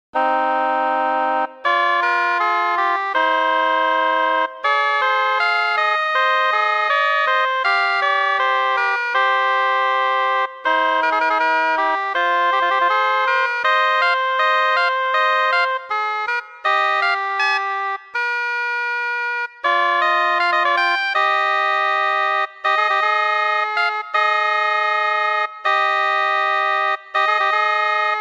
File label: 13 limit 7 tone